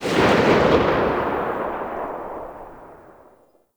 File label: Sniper Alt Fire Tail; DE; Warframe; Frumentarius; Exalted Sniper